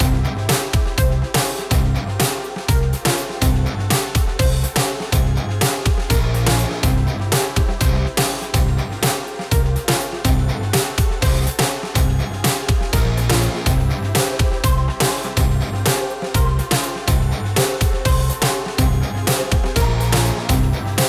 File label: BGM battle normal; starbites